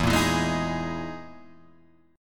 E Major 7th Flat 5th E, Guitar, Drop D; chord shape

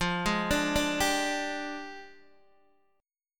F Minor 6th Add 9th F down Guitar Open D

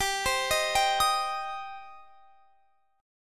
G Suspended 4th Sharp 5th; Gsus4#5 arpeggiated

